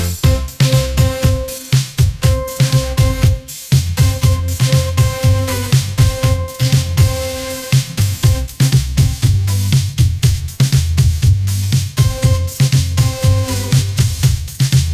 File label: pop instrumental